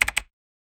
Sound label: keyboard